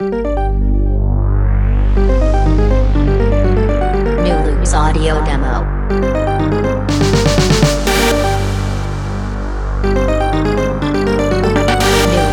Bass House Kit

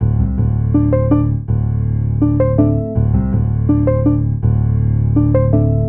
Piano Bass Piano

